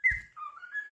complete; sound-theme-pika

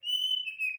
window attention inactive; sound-theme-pika

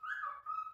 device removed; sound-theme-pika

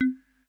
window close; sound-theme-pika

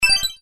dialog warning; sound-theme-pika

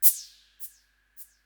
trash empty; sound-theme-pika

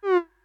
window unmaximized; sound-theme-pika

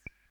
menu popdown; sound-theme-pika

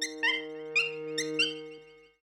desktop login; sound-theme-pika

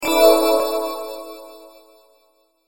system ready; sound-theme-pika

